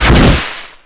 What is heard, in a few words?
rocket; Mini Militia